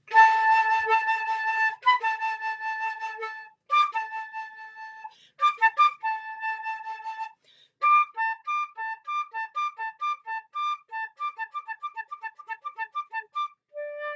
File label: bird music; Vivaldi